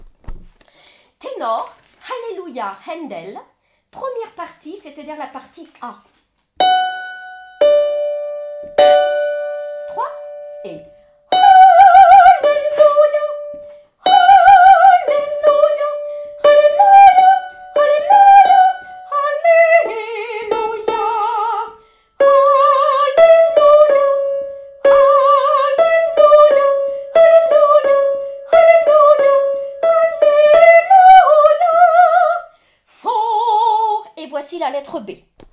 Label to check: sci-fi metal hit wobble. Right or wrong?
Wrong